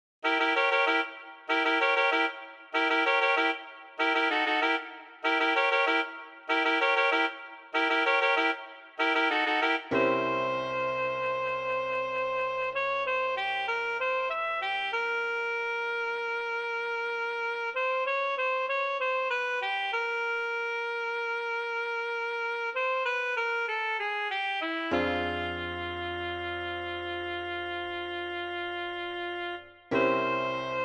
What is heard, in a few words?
Soprano Saxophone